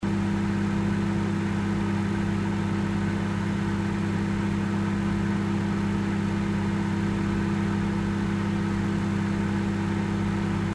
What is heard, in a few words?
microwave